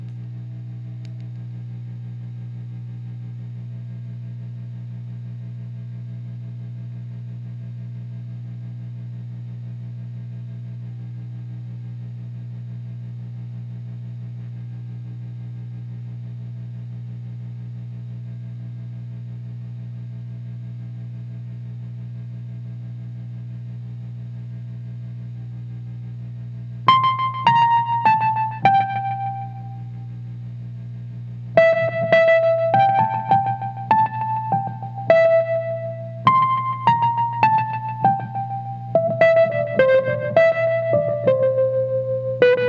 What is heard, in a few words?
wurlitzer